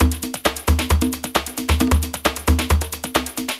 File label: Loop, Roland; Sound & Vision - Gigapack I